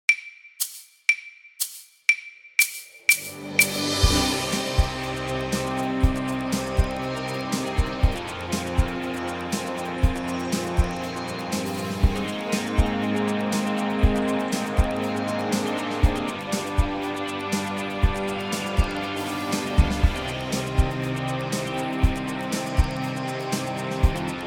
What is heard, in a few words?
play along 120 BPM